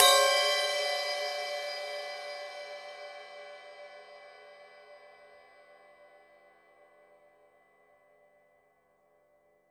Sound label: ride cym; Drums Percussion; Drum Bass; Zero-G; Total Drum Bass